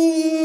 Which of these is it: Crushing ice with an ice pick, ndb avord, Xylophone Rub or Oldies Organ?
Xylophone Rub